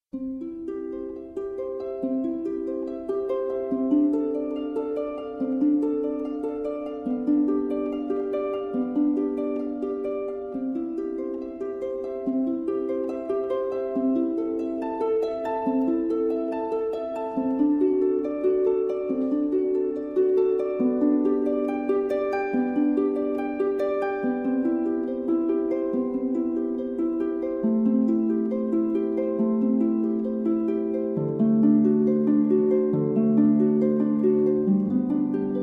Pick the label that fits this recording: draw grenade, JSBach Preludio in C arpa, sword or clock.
JSBach Preludio in C arpa